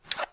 Voodoo Pin Heart; Phasmophobia

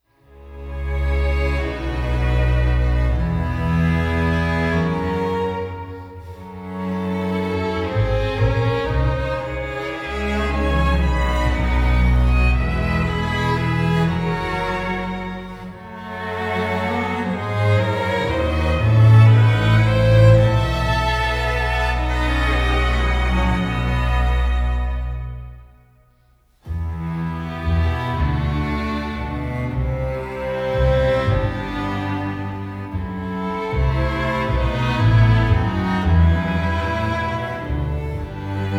Instrumental; Devil May Cry 5

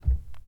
Underneath stairs footsteps; Phasmophobia

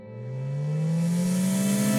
Upgrade item sound; Phasmophobia

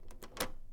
Key unlock; Phasmophobia

